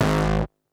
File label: Rough Bass; Vignette Kit